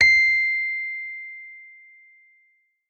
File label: Rhodes fortissimo c7; Session Instruments; Best Service ProSamples vol.42 - Session Instruments